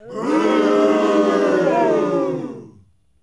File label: boo